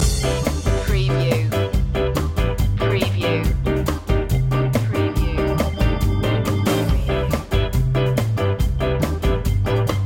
ska loop

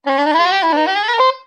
Sax Run

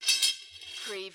Drag Tools

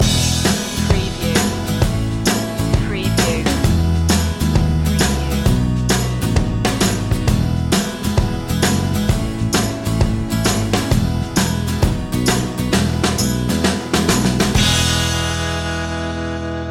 sixties flower power

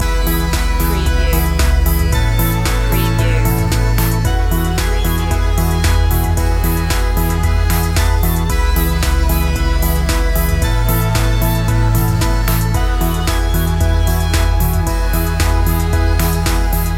modular motion loop

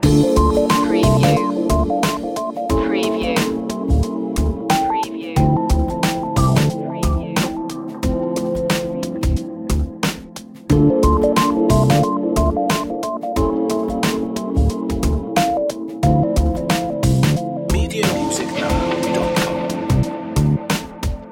the ocean loop

